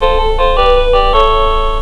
Promotion; Super Mines Demo

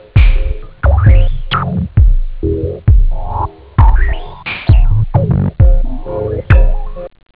trance; OctaMED SoundStudio V1

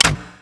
radio off; mechanics; Spearhead Demo; MacFormat 2003 June

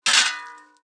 swordsman die; Immortal

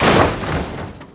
SWIPE, Prangs

